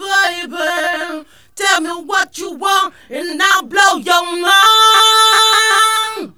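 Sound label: baby tell me, autotuned, Funky Vocals; USB Soundscan vol.18; AKAI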